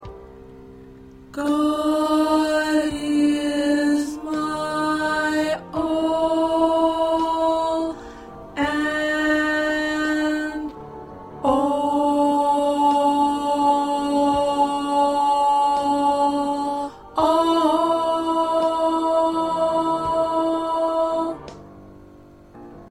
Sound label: 1st Tenor